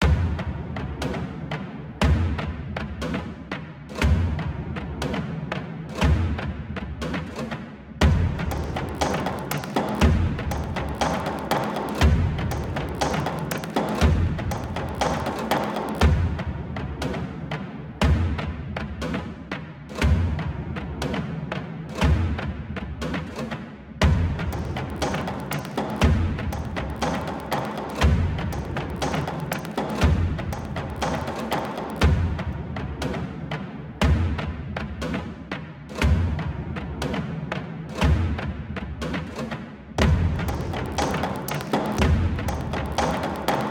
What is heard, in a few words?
Tension Fight Perc